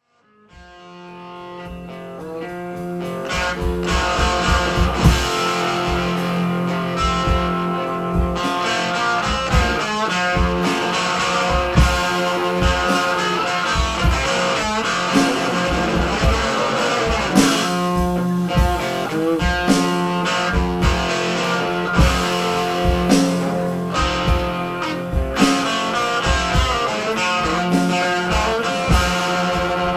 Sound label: Blues